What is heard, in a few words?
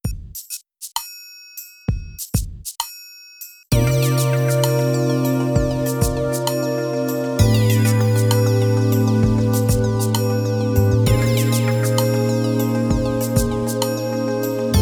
Space Fusion drumless